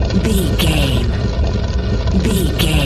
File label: rock door slide block move drag loop